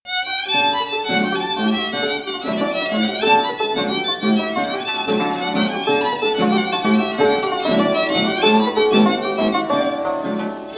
Chiotikos - Grece

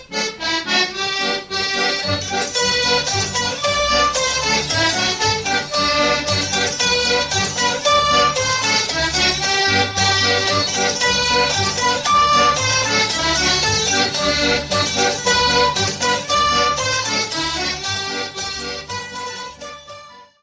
Avant-deux; Belgique

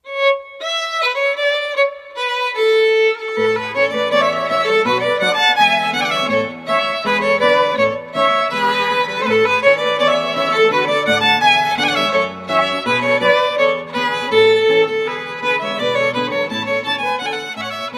Scottisch, Pays-Bas; Scottisch pour Maud

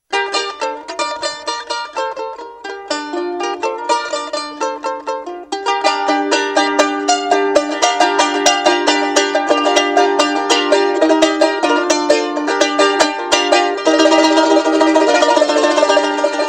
instruments charango

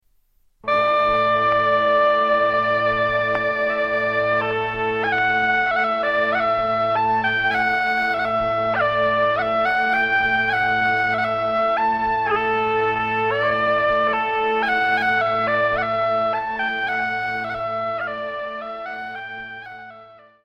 Branle de Mariembourg, Belgique

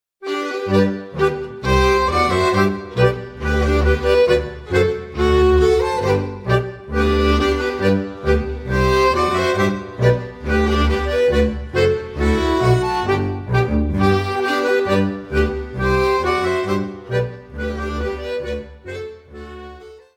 Scottish - Belgique

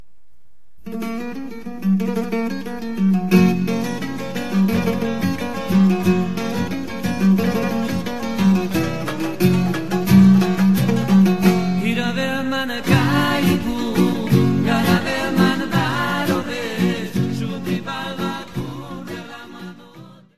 Cocek, Macedoine

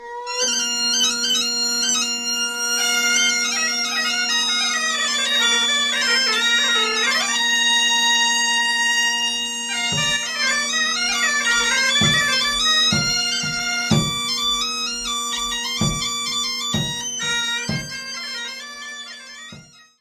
Gavotte - France - Bretagne

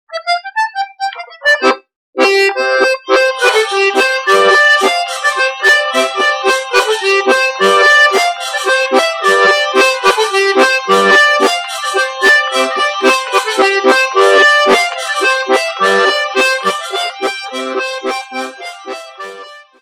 BOURREE, france, auvergne

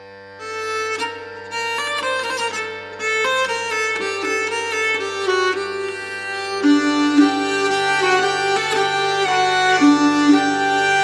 Passe-pieds, Belgique

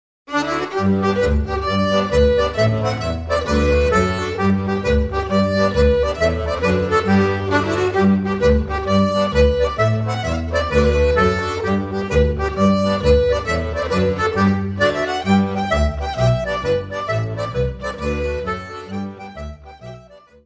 Jigue ecossaise + Allemande, Ecosse + Belgique

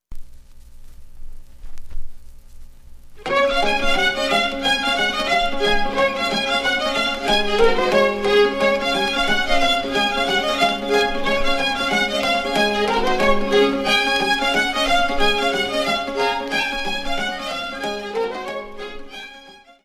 Roumanie; Zamfirica

